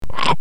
slimy monster or murderd sound

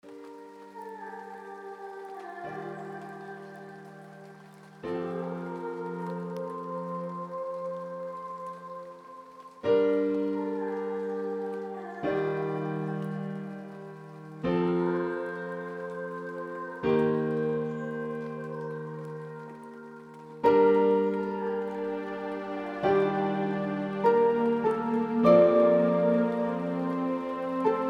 eastern heartlands

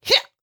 effort grunt male

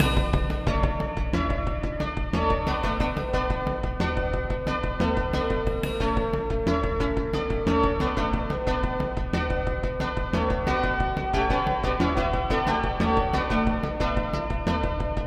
dark forest combat loop

